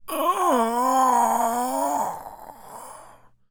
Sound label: Male Taunt, Zombies; zps; Male1